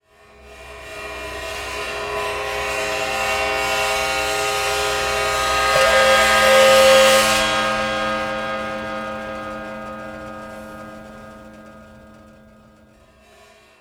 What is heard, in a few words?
Prepared Drums